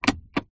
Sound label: car automatic transmission lever release button press; transport